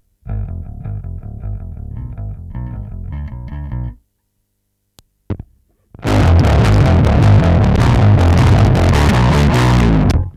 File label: bass